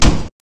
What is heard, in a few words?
gun; mcheli